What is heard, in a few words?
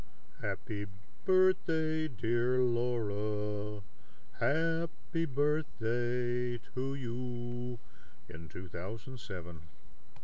birthday singing